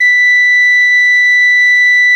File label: B6, garklein; SoundBytes - Early Patches